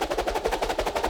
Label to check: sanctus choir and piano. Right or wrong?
Wrong